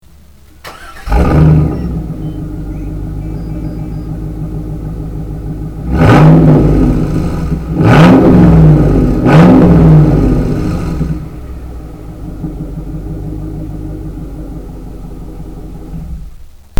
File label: borla z28